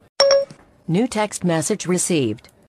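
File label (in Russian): звук и голос сообщения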